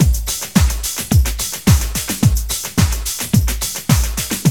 HOUSE LOOP, Ethnic; Zero G - Ethnic; ETH TECH HOUSE

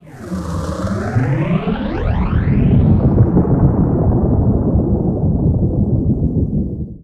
NOISE, Production Effects

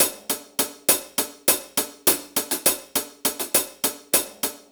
Drum Break; Trip-Hop; Loops; Sonic Foundry (Sony Creative Software); Rondo Brothers Trip-Hop Loops & Samples; Liberation

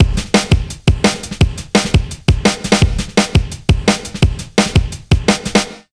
170 BREAK, Explosive Jungle; USB Soundscan vol.05; AKAI

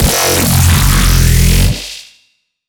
blank growl, bass

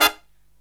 LOW CHORD, Killer Brass Riffs

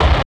RETRO HIT, Synthy Tonal; Distored Reality

